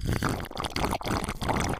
vital trinkt; minecraft

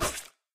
waffen messer; minecraft

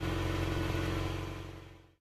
auto motor; minecraft

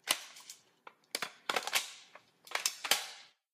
waffen laden; minecraft

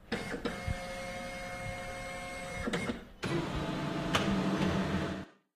kopierer; minecraft

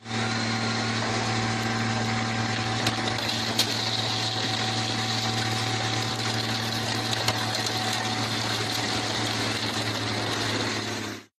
schrottpresse; minecraft

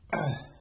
fitness stoehn; minecraft

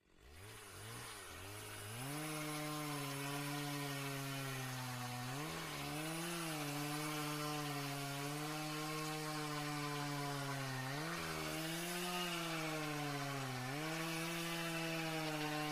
kettensaege; minecraft

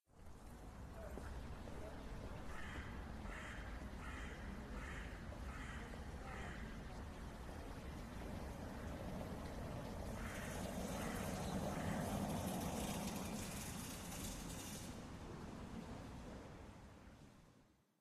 fuessgaengerzone; minecraft

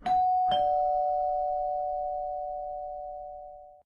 tuerklingel; minecraft

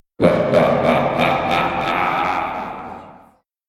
hupe; minecraft

